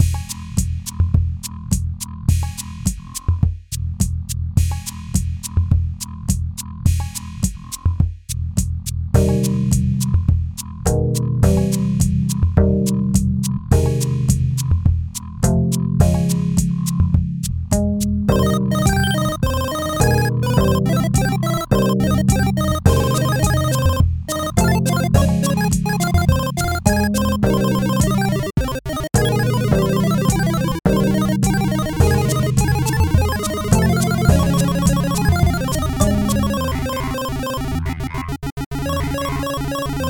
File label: Ghost Bass